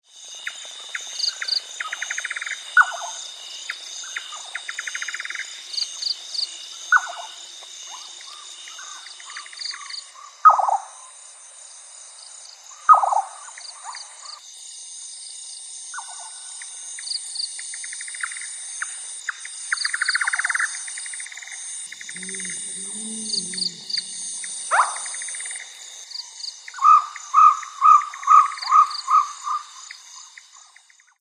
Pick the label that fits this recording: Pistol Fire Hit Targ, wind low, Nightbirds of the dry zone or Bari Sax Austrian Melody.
Nightbirds of the dry zone